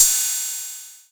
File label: Crash, TR-606 Bent Samples; Sonic Foundry (Sony Creative Software); The Electronic Music Manuscript Collection; Battery 3 Sample Kits